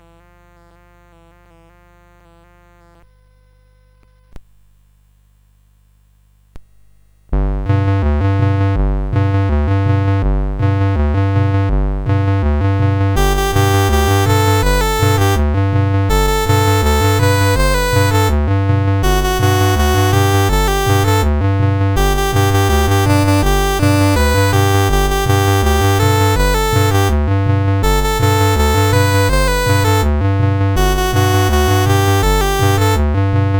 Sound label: CSG8580R5